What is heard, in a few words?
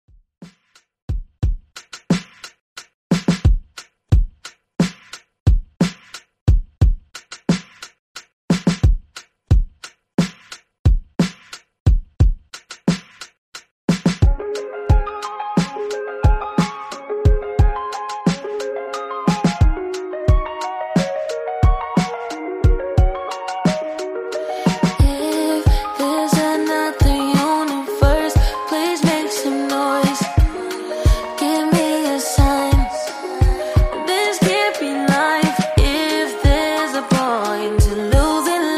Top40 Mixshow Intro 89 Bpm